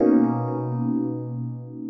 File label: B STAB, funky